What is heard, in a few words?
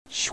Slide